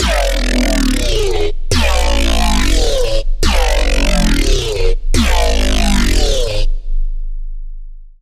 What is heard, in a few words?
Growl; Abyss Creature; Routed Sound; Serum 2 Expansion - Chaos Bringer Vol.1